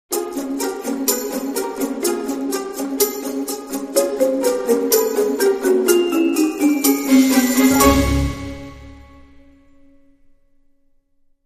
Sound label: Dramedy Sting